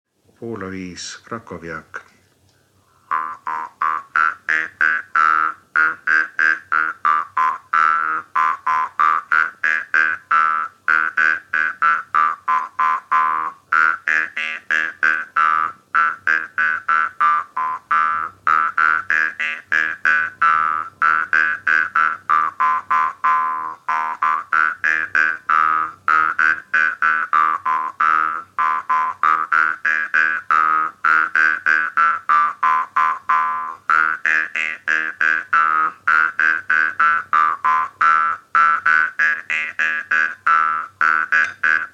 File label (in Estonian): Poola viis krakovjak parmupill folk; Viru-Nigula